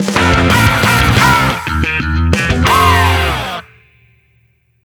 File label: Victory! - Jingle; Splatoon 2; Octo Expansion